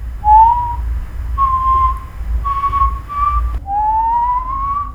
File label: real whistling